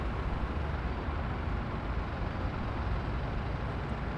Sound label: window; Mafie 1